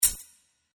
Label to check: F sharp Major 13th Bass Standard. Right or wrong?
Wrong